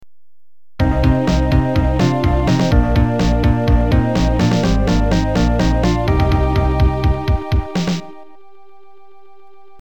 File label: stage cleared; castlevania; Amiga